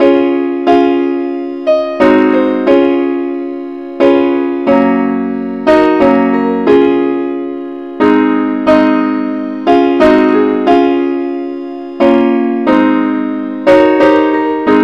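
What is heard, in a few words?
simple accompaniment key c sharp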